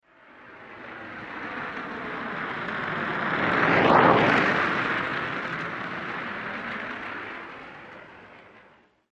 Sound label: Blow Torch By At Medium Speed, With Lots Of Air Ripping